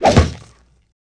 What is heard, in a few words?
fighting swipe bone break